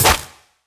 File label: impact flesh; smite; raijin